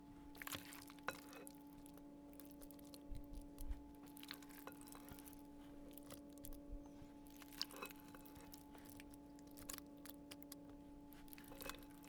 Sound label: Dropping Pasta